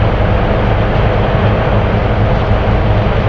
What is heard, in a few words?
crane idle loop; hl2